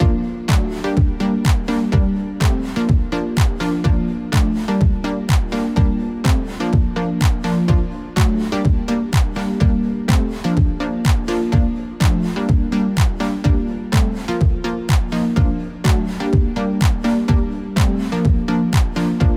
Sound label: chords